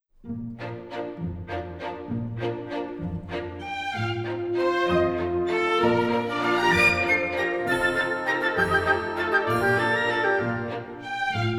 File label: Glissades Sissonnes And Assemblés Male Solo; Flower Festival In Genzano